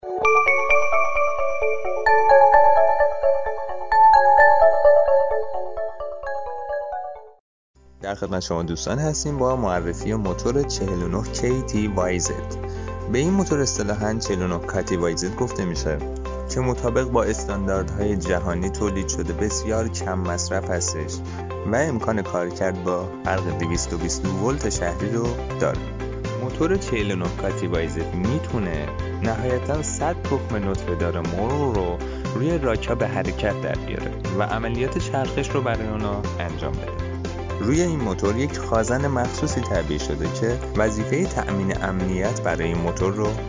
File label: motor egg incubator